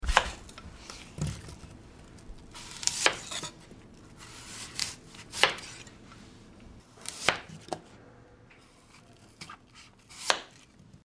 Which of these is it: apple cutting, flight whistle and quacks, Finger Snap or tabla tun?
apple cutting